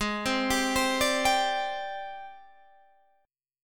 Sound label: G sharp Major 7th Flat 5th Guitar Half Step Down